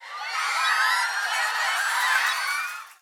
people studio kyaa

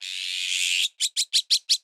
cyanopica cyana cry

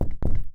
wind knock

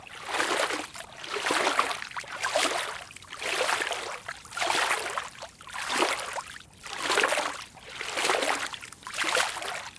walk soak water

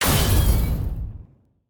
machine hard hit

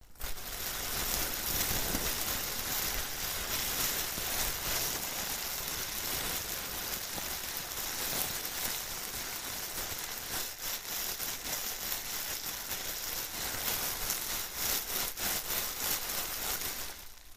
bag plastic

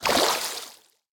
catch fish

